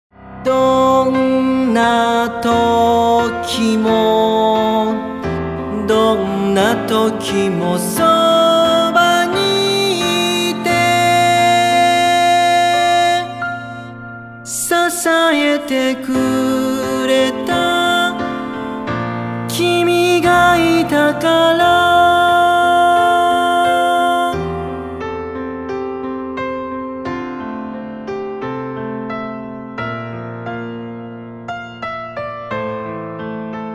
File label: solo